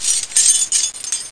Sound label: Keys